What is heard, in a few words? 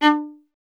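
STR VLA D1, strings viola; Miroslav Vitous; Solo Instruments VOL-1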